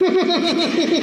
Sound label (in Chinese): 邪笑; LockChime; 赵本山; 反恐精英